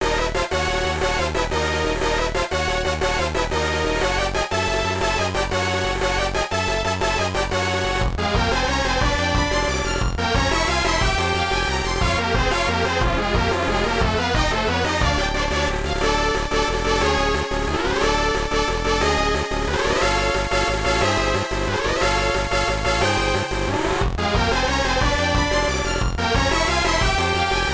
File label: Boss Battle